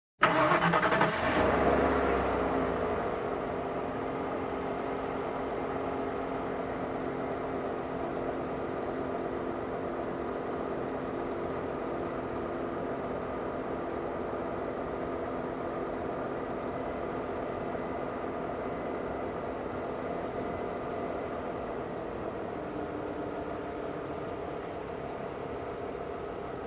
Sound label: SUV engine ignition in distance